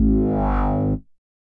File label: bass note, Trancefunk 134 F#min; Big Fish Audio; Hardcore Breakbeat Action; Trancefunk Mutator